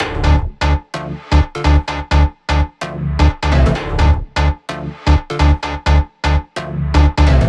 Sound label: 128BPM Melody; Vengeance Samples; Emote Kit